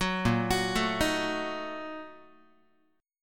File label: A sharp Major 7th Suspended 4th Sharp 5th, Guitar; arpeggiated